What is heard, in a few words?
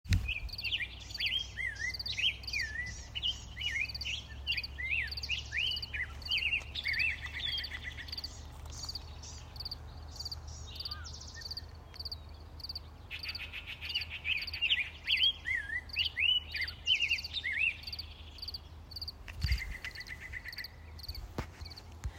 Yellow breasted chat